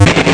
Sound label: Blip Static; Total Distortion